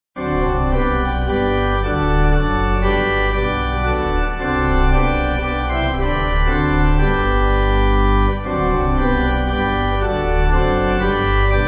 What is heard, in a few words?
Pipe, Pipe Organ